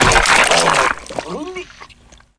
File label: gib; SINDEMO; player; blade